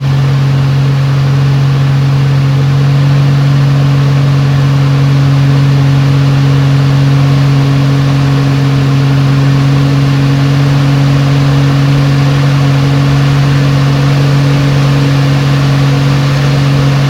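Extra, 330LX, Onboard, Fly, High RPM to Back Off Throttle, High Medium Low RPMs, Engine; Pole Position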